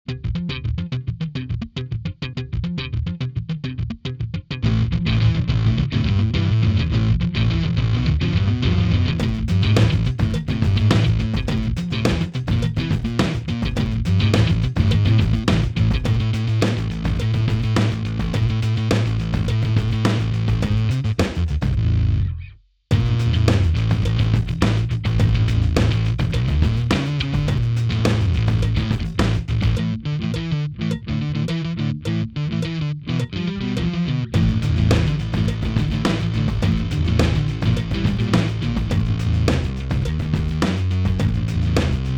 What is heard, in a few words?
murky bass